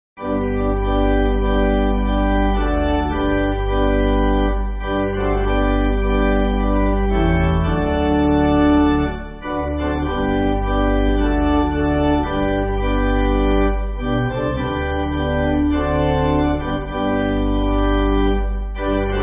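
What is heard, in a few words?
Pipe Organ, Backing Files for Churches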